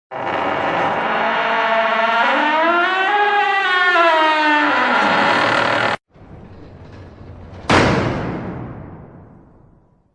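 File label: dver v svet zvuk skrip, door creak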